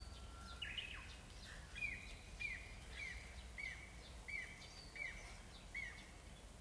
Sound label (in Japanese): ウグイス アオゲラ